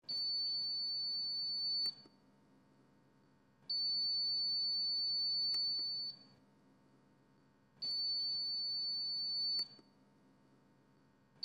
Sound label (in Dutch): sondevoedingspomp; kind op bezoek op de intensive care